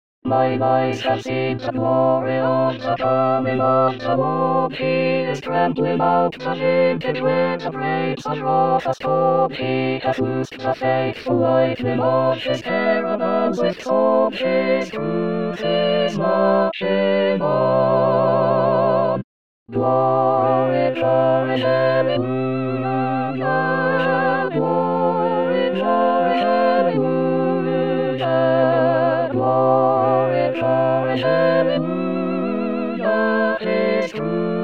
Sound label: Battle hymn of the republic